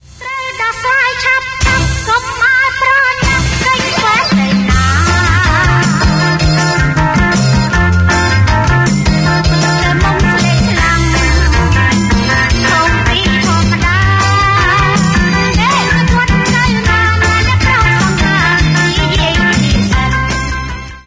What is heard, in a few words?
Cambodian Rocks